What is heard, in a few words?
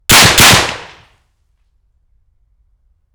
FN FAL 7.62x51mm 25m in front 2 shots; Holophone; Pole Position Production